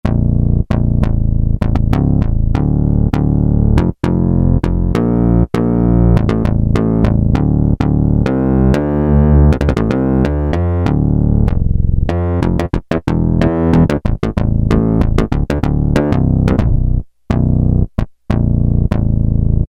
Syn Bass 8vb, Roland W-30; Factory Performance Patches

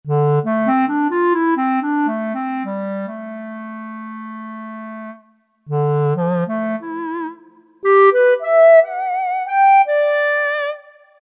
CLARINET; Roland SN-U110-06

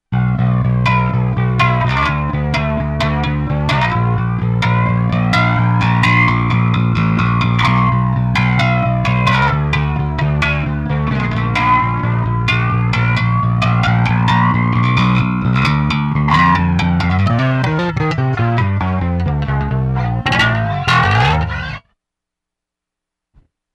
JET BEAT, Zoom 506 II

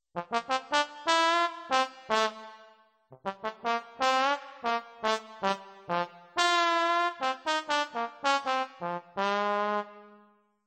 trombone, Yamaha PSR-530

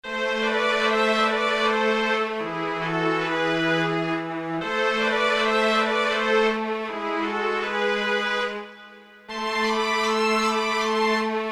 HIGH, Strings, Korg DSS-1; Strings II

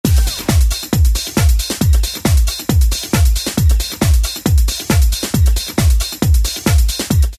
Fast Euro 136; Dance; Roland SR-JV80-06

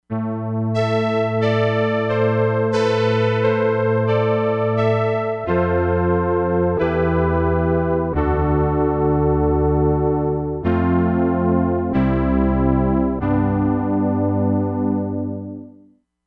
SAWTOOTH BACKGROUND, Crumar Bit 99; Factory Programs

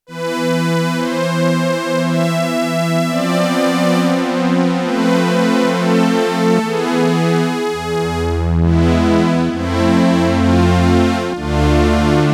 HIGH STRINGS, Korg DW-8000; Factory Patches

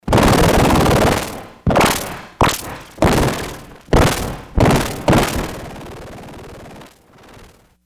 Gun Gun; Sony HR-GP5

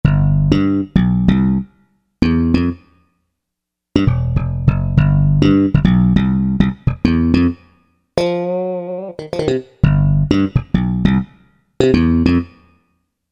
Bass, Korg MSC-6S Fretted Insts; Fretted Insts 1; Perfm.Bass

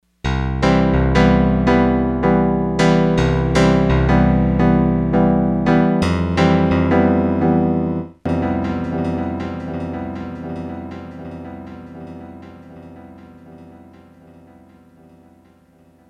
PINGPONG DELAY

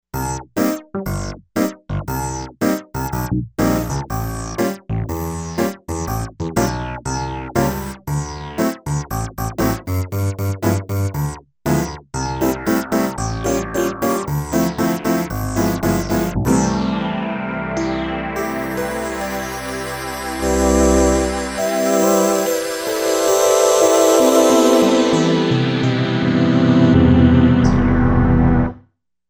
Weekend Piano

